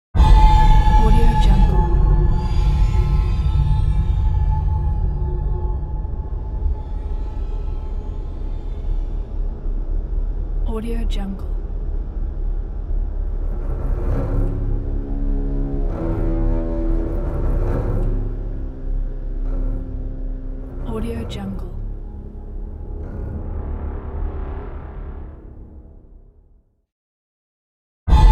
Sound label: dark horror powerfull accent